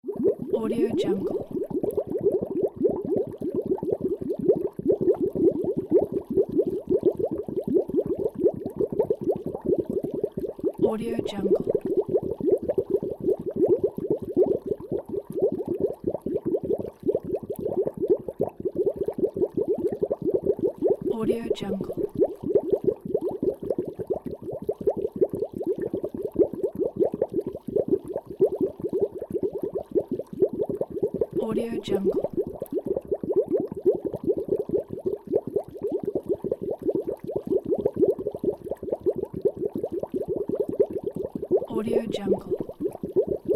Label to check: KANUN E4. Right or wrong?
Wrong